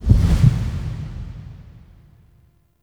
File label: Corpse Inspect In; Warframe; Cephalon Weave